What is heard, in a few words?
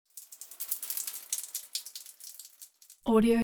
waterflow; soundbits-soundfx